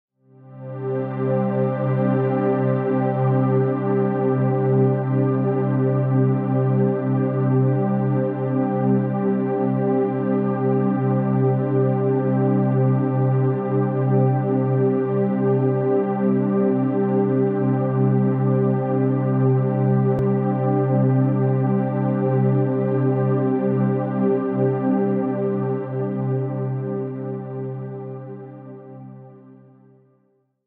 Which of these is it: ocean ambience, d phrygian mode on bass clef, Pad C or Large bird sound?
Pad C